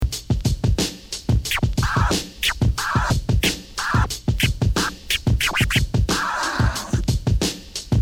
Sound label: chops beat